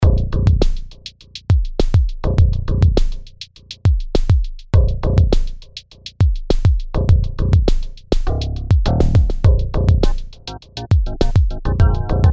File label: DX7; Spectralis